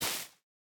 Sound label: step, spore blossom; minecraft